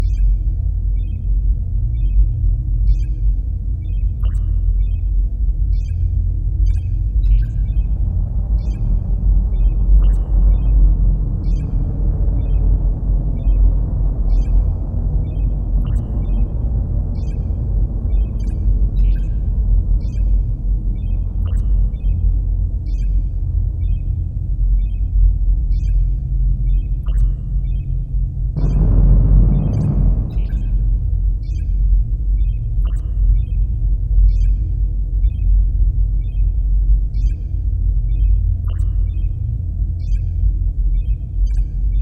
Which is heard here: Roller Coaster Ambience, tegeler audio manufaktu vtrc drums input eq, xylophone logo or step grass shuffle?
Roller Coaster Ambience